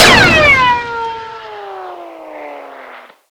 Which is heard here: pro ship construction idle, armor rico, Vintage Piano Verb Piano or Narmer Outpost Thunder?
armor rico